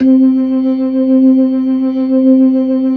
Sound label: LO MAN V R, ORGAN LOWER MANUAL